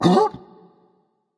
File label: surprised berserker; cuchulainn